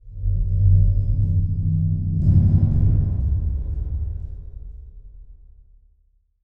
Transition Stab Dark Medium; Production Element; Title Transition; AdobeCC

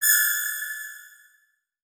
Beep Long; Adobe; Multimedia Internet CD-Rom Flash

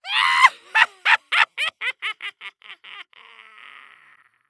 Horror Scary Human Laugh Crazy

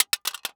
Electronic Audio Tape Case Fall To Ground

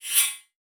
Multimedia Internet CD-Rom Flash Short

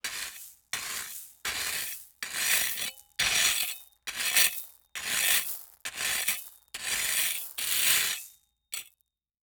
Industry Tool Gravel Raking With Metal Rake

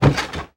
Couch Bump Springs Squeak; household

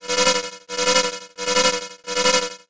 Science Fiction Sci-Fi Electronic Alarm Fast

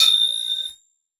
Beep High Pitch; Adobe; Multimedia Internet CD-Rom Flash

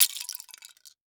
Crash Debris Plastic Dropping On Cement; crashes; AdobeCC

